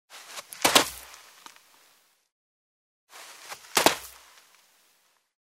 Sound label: Jump in the sand; steps